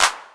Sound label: Cool clap